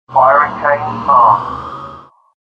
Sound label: silo firing chain; Dystopia